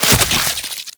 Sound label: Coolant Turret Fire; Warframe; Corpus; Arachnoid Coolant; Abilities